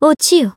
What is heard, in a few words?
Throw (Aerial); BBCF; Izanami